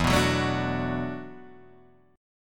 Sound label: E 7th Suspended 2nd Suspended 4th, Guitar, DADGAD